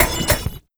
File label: Metal; Sonic Foundry (Sony Creative Software); Esoterik Beatz; Bello